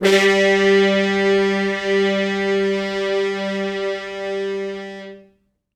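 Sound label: French Horns stops, G2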